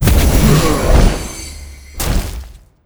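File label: Boss Owl Bear spawn; monster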